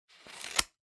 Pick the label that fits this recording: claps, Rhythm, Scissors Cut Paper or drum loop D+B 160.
Scissors Cut Paper